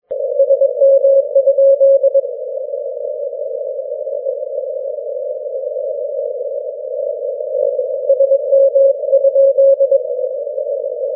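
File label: 6m continuous wave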